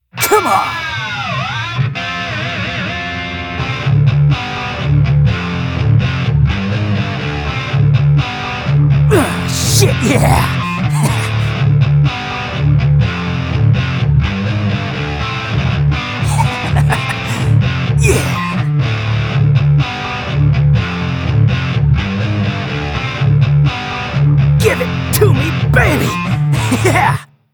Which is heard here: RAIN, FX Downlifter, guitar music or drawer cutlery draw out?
guitar music